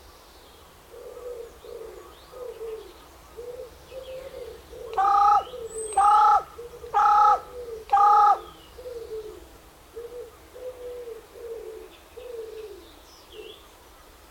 Carrion crow

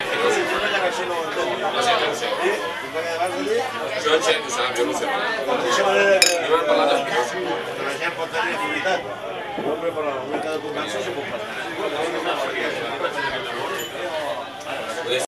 Cafe, Girona

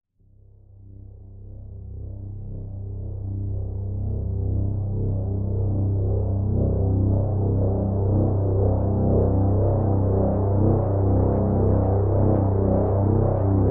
Ambient Bass Deep Dark Phasing Bassy Swell

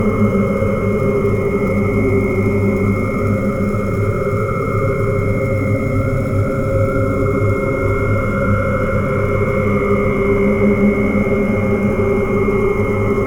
Bad Field Drone

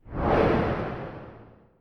Woosh

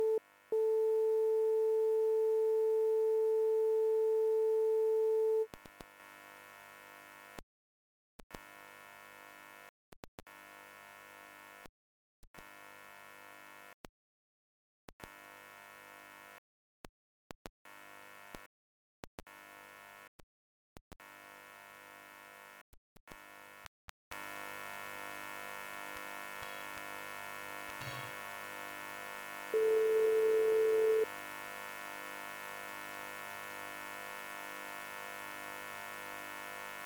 Old Telephone Switch 500 Selector

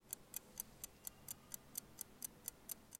Watch

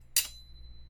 Metal Crash

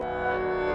Piano Sound

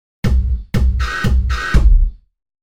Beat

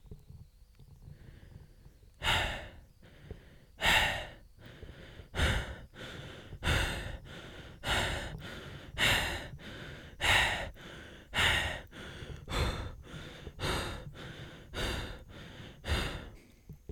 Heavy Breathing

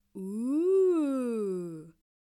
Ooohh

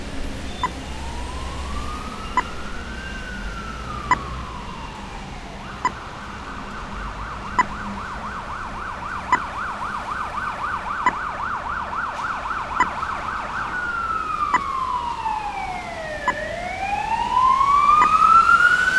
Traffic Light With Ambulance

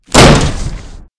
Wooden Door Smash Open

